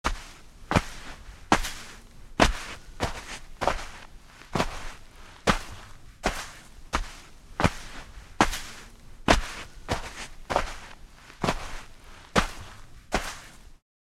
Footsteps walking on sand with shoes

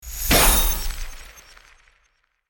Magic poof sparkle whimsical; fairy

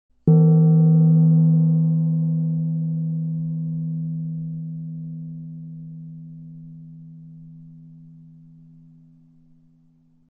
Chinese gong hit

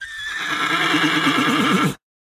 Horse neigh angry and nervous

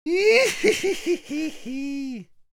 Crazy male psychotic laugh

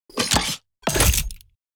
Sci-fi weapon reload gun reloading

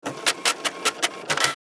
Cash machine printing receipt

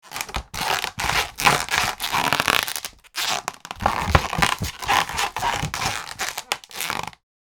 Hard plastic crunch; gfxsounds

